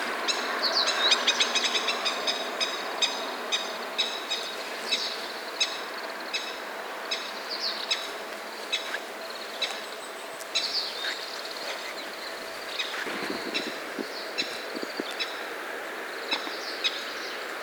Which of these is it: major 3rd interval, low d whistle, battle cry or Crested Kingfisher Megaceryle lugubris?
Crested Kingfisher Megaceryle lugubris